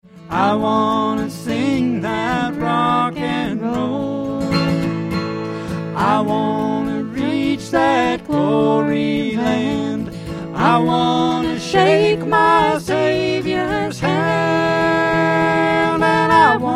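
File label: acoustic string band, recorded live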